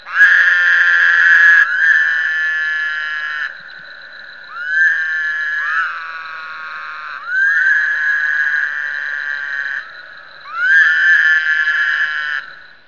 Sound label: Wood Toad